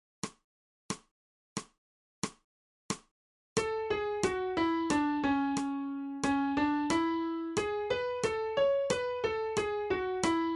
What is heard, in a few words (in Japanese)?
新フレットレス